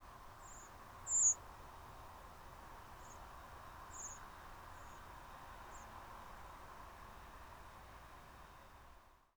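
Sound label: secondary modulation, Redwing Turdus iliacus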